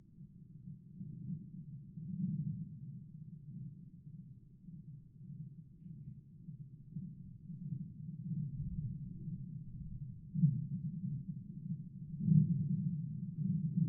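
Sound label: Piezo People walk steel bridge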